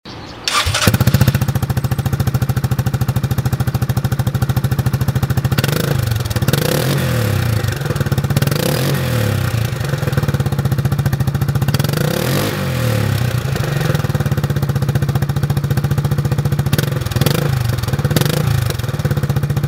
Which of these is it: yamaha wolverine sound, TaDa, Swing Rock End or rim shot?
yamaha wolverine sound